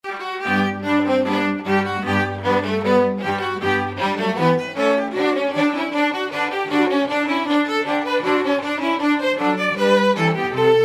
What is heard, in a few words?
Brandenburg Concerto, String Quartet